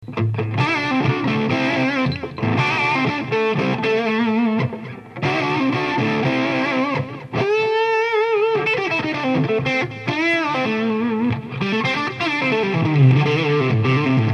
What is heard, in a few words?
6v6 Rock; Plexi